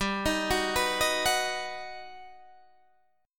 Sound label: G Augmented Major 7th, Guitar, DADGAD